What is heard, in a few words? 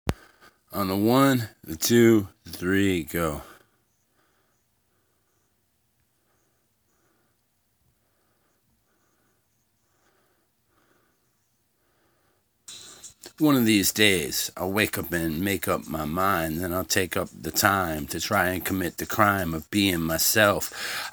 snare rush vox